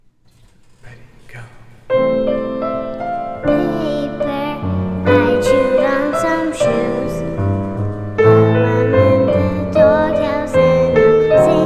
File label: Vocals; Recital